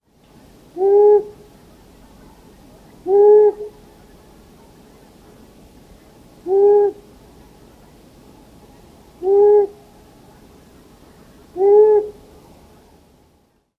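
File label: Long-eared Owl hoots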